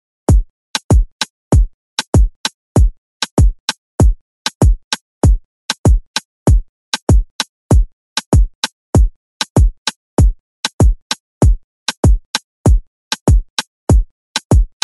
ReDrum; DJ Mhark

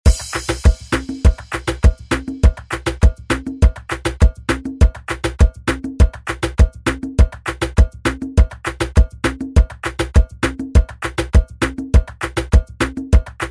ReDrum; DJ Mhark

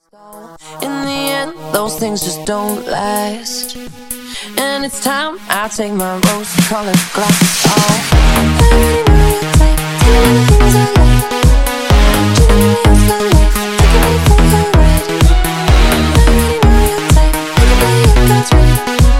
Funk Remix 127 Bpm

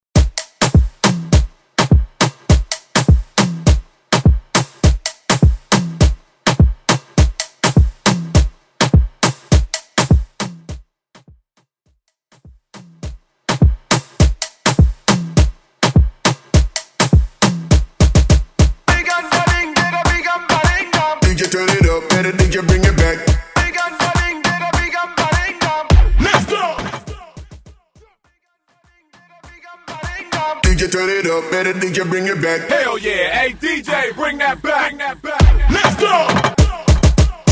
Moombahton; Mainstream VIP

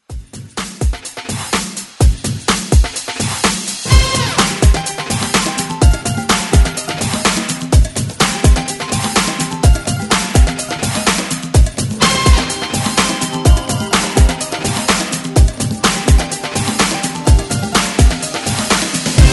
80s Re Drum 125 Bpm; DJDOLLS

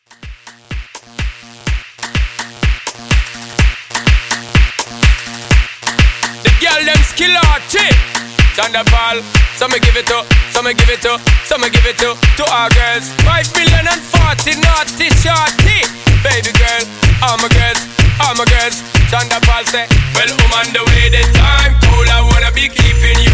Afrobeat Re Drum

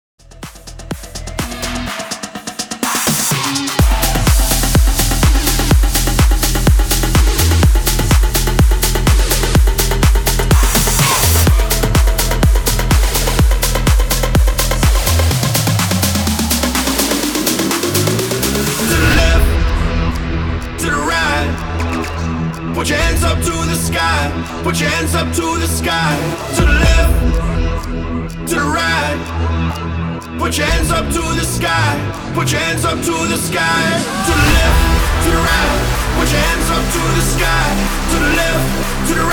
MASHUP 125 BPM

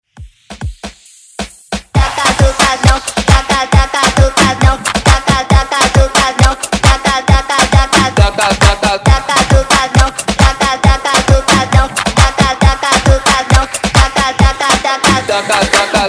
Dance Redrum

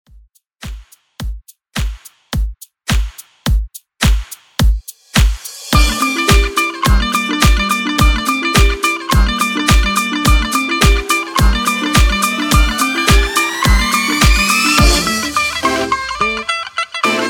Redrum

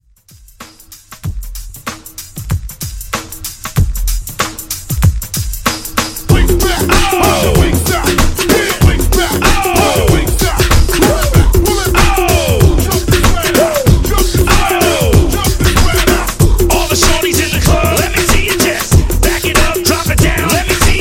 Throwback Hip Hop Redrum Hype; Remixfreakz

